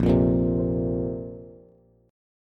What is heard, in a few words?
A sharp Suspended 2nd A sharp down Bass